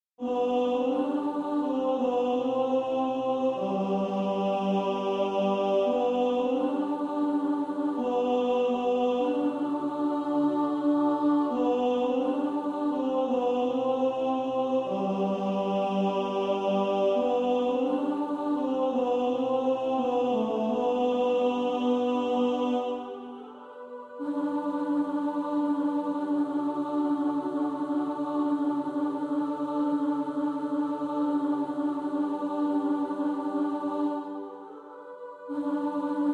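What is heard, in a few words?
tenor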